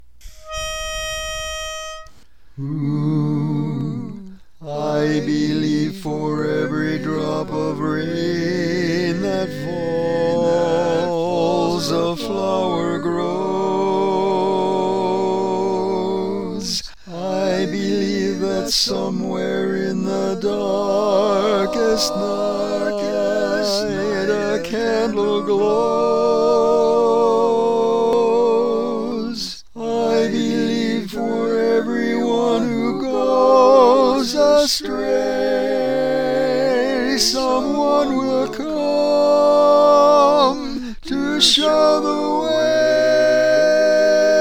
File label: Lead, barbershop